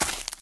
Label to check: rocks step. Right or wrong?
Right